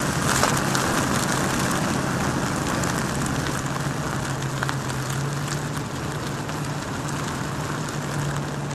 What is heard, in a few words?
Car Tire Slow Grit Movement, As If Pulling In